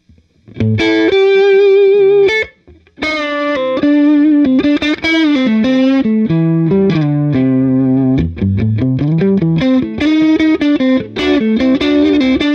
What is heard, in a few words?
neck, Compulator EQ, comp tests